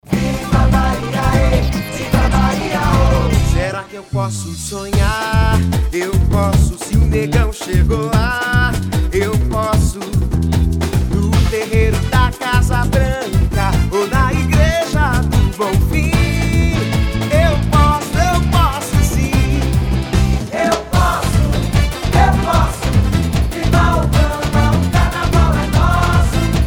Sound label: jingles carnaval; estudio ellos; carnaval de salvador; obama